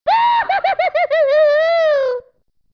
powerup; db mod; superbuu

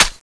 reload; thanatos5; cstrike

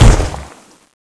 rush end; Frozen Terror

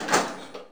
door metal open; left4dead

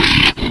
normal dash, zombie; ZombieDarkness

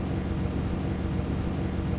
airburster idle, weapons

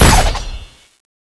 explode; thanatos5; cstrike

